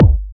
good kick drum sample g key, kick drum one shot tuned to the G note